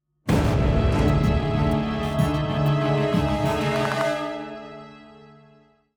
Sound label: 190bpm; Legends of Pegasus; windows gamerip 2012